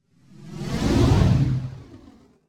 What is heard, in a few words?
Passing Space Ship - Buzzing Engine; Hollywood Edge; sound designer's toolkit v2